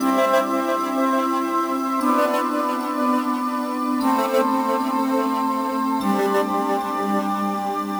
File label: Flying, pad